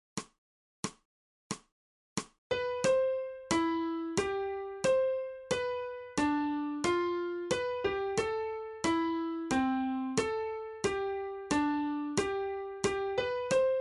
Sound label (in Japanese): 新フレットレス